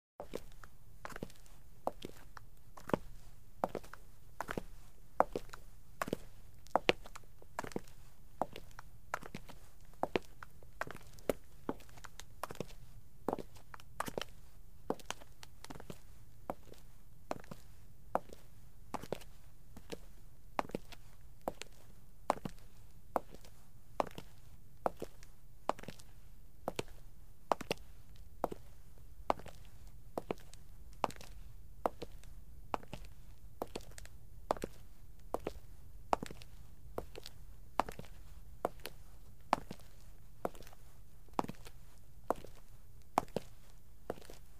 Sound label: Steps on the concrete floor in shoes